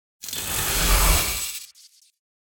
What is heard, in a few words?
electric starship door; fvtt sonniss gdc audio